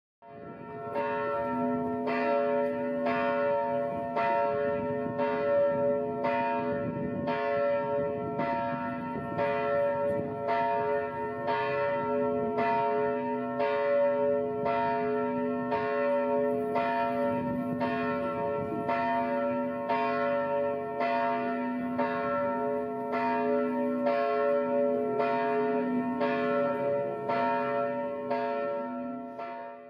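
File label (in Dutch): strijen klok, het geluid van de kerkklok; Dorpskerken in de Hoeksche Waard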